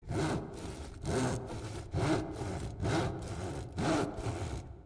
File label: HAND SAW, construction; zones